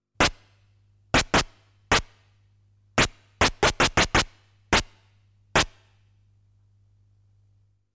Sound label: Enemy Attack